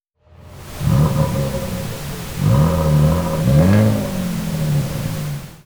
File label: Rev pink